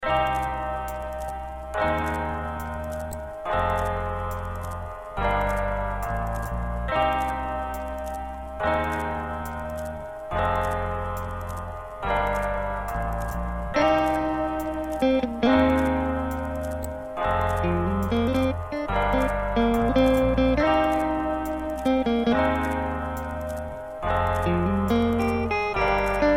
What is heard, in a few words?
lofi